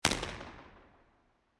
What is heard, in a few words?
tennis court omni, falkland palace royal tennis court